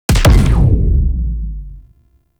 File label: Punch, Weapons; Warframe; Corpus; Tenet Quanta